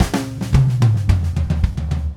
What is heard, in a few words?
Red Maple Groove 110, DW Red Maple Kit